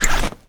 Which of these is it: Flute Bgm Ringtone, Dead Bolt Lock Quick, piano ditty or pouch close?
pouch close